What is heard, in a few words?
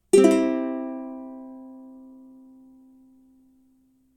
Ukulele